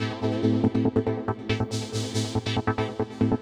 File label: TECHNO SYNTH 140; Keys